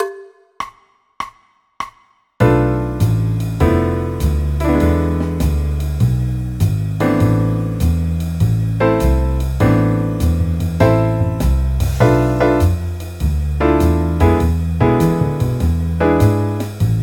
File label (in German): a moll akkordverbindungen